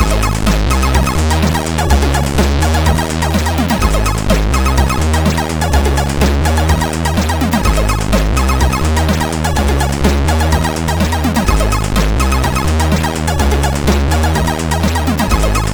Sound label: Beach plus Bass, CSG8580; sid; CSG8580R5